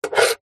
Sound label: office pen biro draw line on metal box